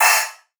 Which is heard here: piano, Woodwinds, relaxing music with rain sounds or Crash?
Crash